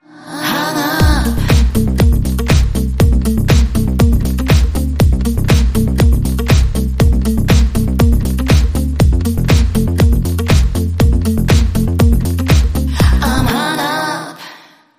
In And Out Less Guitar

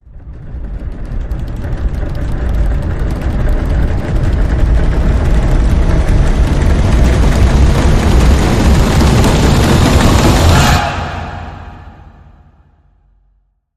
Roaring Crowd Rise

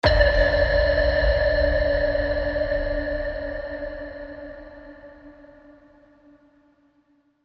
Silent Threat Sonar Ping

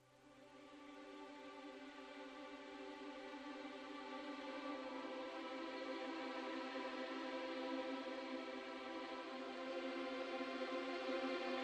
Strings Only

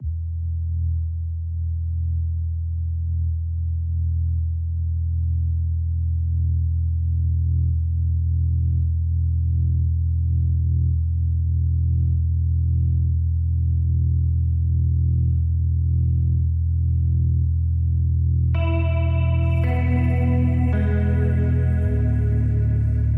Underscore

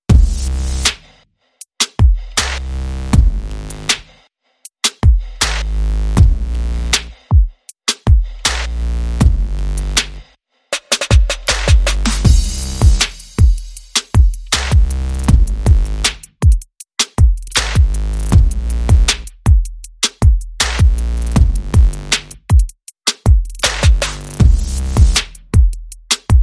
Bass and Drum

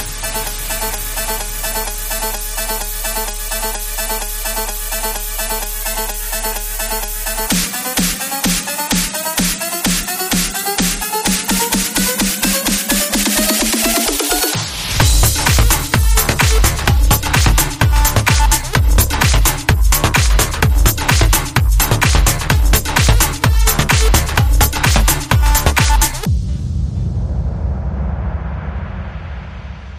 Fire Beat

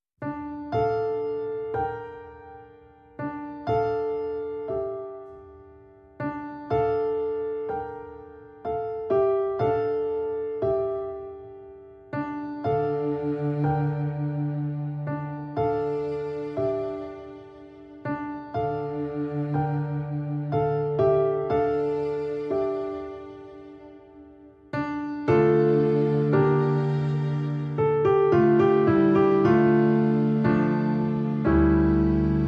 Piano Theme

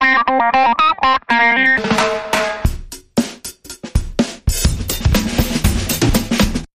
Dig It Baby Rock Bumper

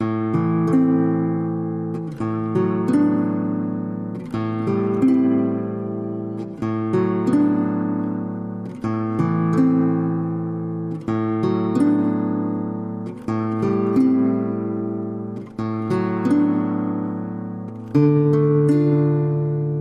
Acoustic Guitar

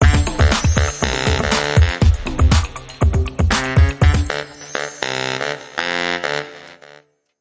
The Baritone Sting

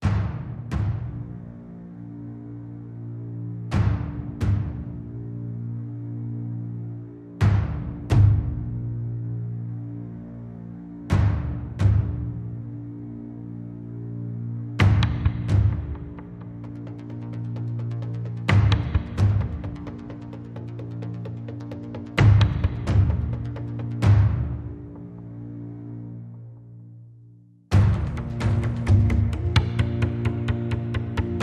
Percussion & Drones

